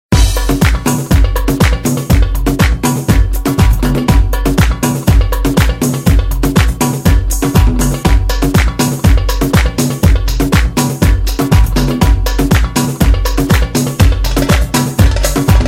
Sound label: Bass